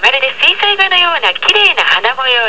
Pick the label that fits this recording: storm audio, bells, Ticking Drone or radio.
radio